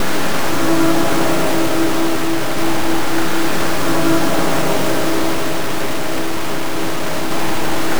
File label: Drone Ambient; Drums & Drones